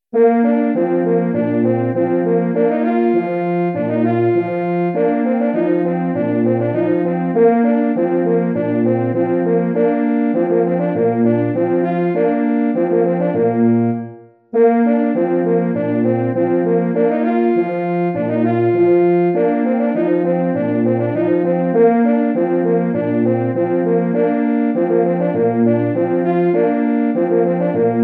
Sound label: Horn duos